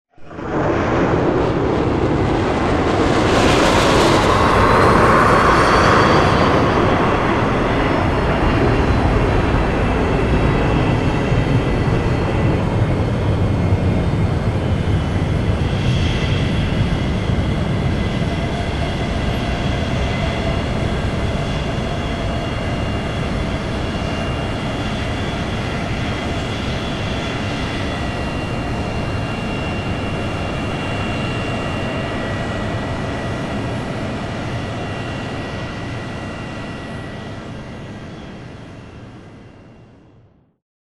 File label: large ship takeoff; Titanfall 2